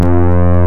analog

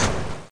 crash

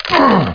land; quake sw; player

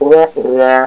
BAD trombone

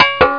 CLINK

